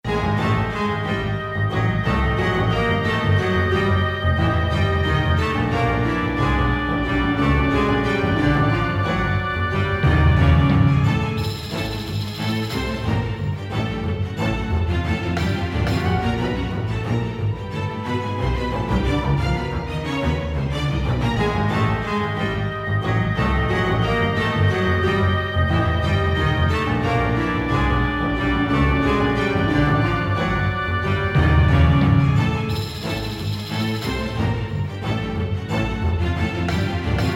Trap Fast; Luigi's Mansion 3; Tomb Suites (10) - Jewel Chamber Trap Fast

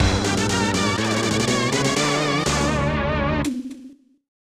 Lose Competition; tokimeki memorial; snes

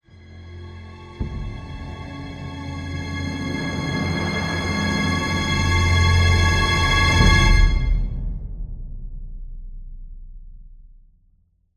Sting Horror

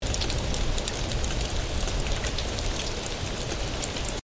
Env Rain; Joan of Ark

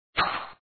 Attack Hit

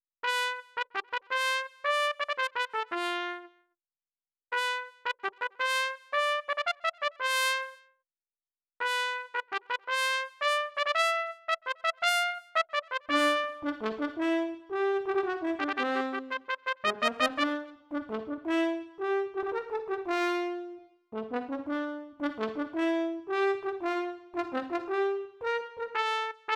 melody; game builder garage 2021 switch

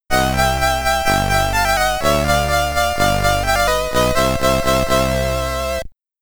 SID Stereo, commodore 64

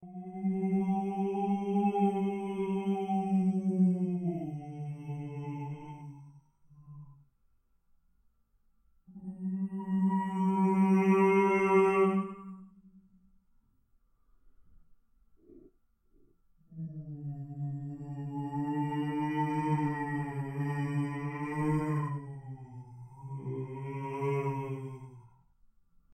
Ghost Sound Monster

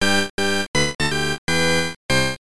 Lose; Hit Soccerball

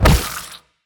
Shot Flesh Impact; Prison Architect

